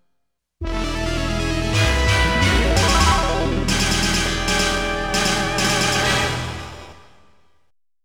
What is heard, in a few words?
A Death Cry (Boss Outside BG); Konami Game Music Collection